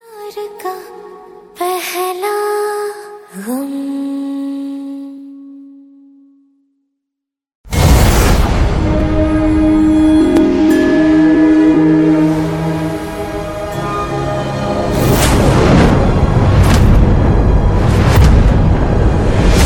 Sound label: hindi ringtone